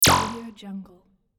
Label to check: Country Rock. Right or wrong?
Wrong